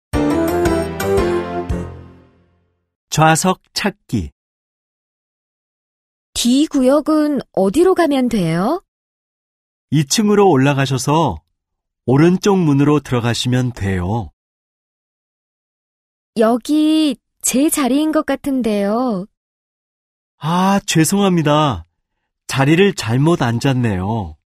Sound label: K-POP